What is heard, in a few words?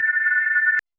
short quiet smooth high p, pad